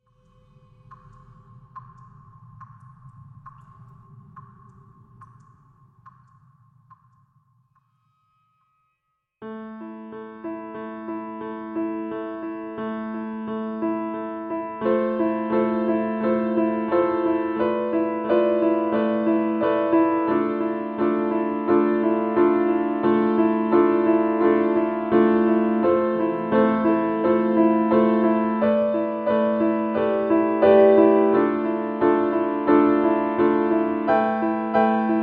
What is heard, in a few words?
Piano Solo; Roots Theme; rusty lake roots b side